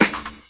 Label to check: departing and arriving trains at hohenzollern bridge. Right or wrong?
Wrong